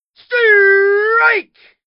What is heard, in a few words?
strike, baseball hit